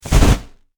Science Fiction Flame Hand Booster Short Burst Dry; Spacecraft Sound Effects; SFX Bible 6